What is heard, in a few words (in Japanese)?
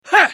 攻撃短; パンファルロ